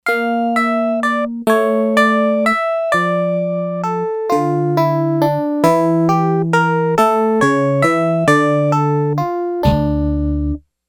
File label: Synth Decay 2